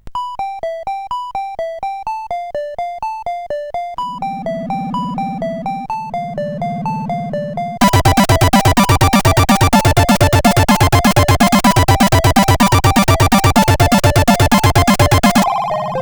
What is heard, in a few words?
Intro Tune, sid CSG8580R5